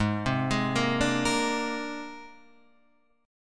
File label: C 7th Suspended 2nd Sharp 5th Ab